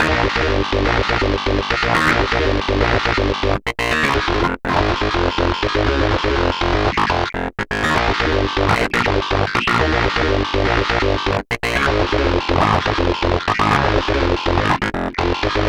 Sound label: synth arp; Electrocution